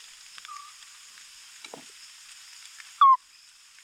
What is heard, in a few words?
Synapturanus zombie; guyane